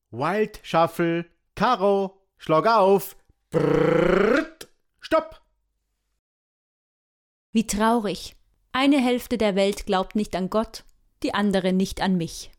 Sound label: wild shuffle string